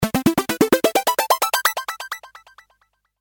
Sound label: Warp, phonetones; Sony Ericsson; W950